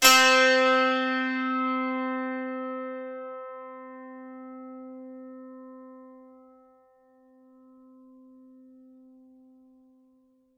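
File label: C4, dulcimer pluck; SoundBytes; Early Patches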